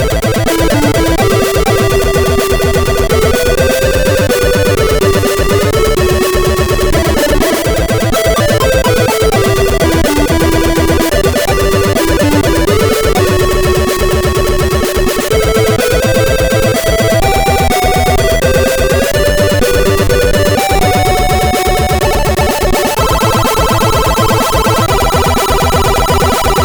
Unused Demo Remix, sid CSG8580R5

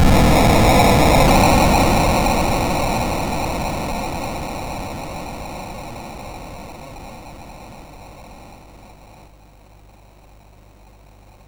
MOS6581

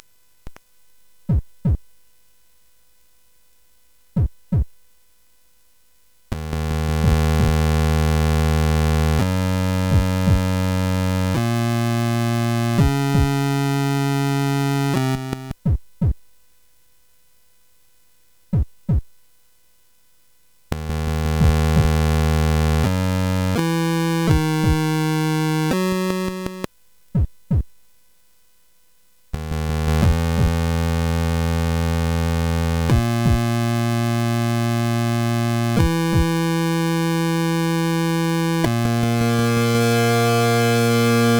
Space Gun

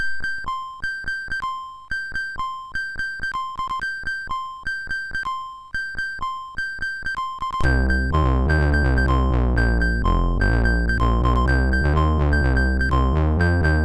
Acid, MOS6581R2

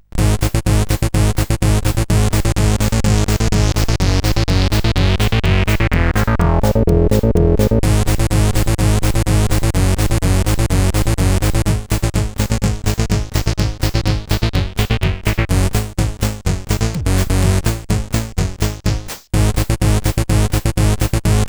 Techno, sid CSG8580R5; Kaare

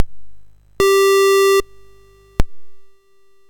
Dragonworld sid MOS6581R4

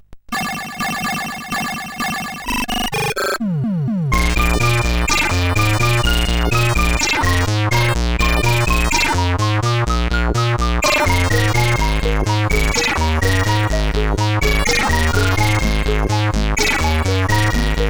Harmonic sid CSG8580R5

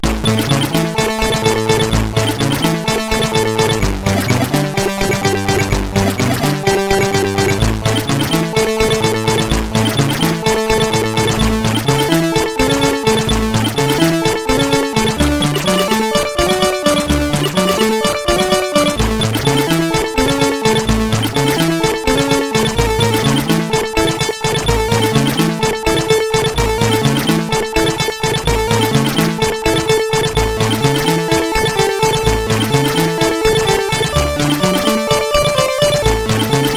Hi Freak, CSG8580R5

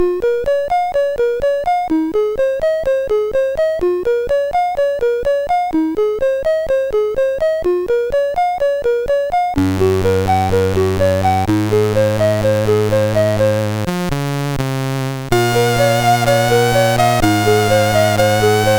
Instrumental, MOS6581R4

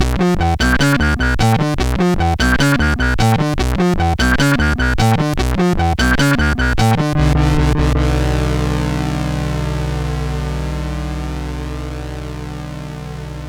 Fatamorcana intro sid CSG8580R5

